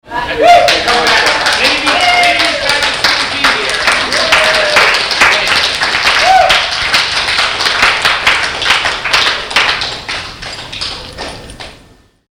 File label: applause in atlanta; the unknown; dac atlanta